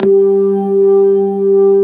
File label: B3 HAM, Keyboards Old School